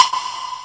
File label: Electro FX; Ueb HE Electro FX